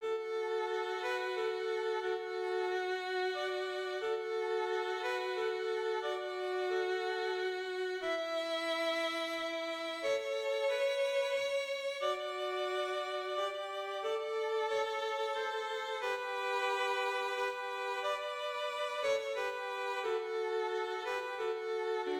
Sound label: Silent Night Violin Duet